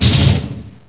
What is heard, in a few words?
CLANG, 碰撞声音素材